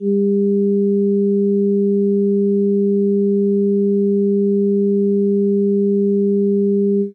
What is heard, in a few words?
Beats Secondary